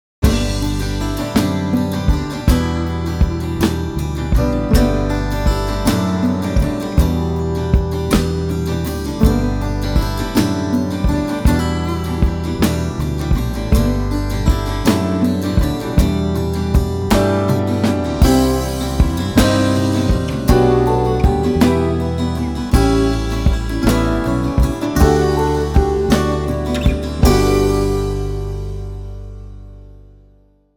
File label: INSTRUMENTAL